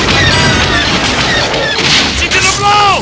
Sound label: death; stormtrooper